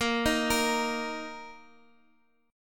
D sharp 5th

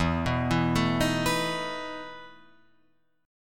E Major 7th Suspended 4th, Guitar, Open F; EM7sus4 arpeggiated

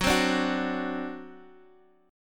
C Suspended 2nd Flat 5th Gb

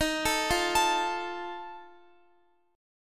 Eb Suspended 2nd Flat 5th, Guitar; Ebsus2b5; arpeggiated

